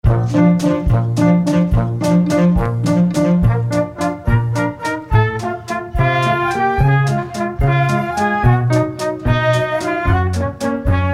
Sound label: Exit Music